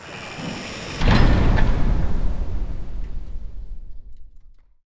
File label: freespin Exit; fairymirror